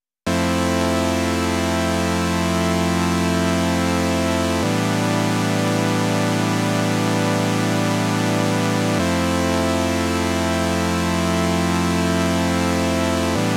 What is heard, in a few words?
Electrical Meditation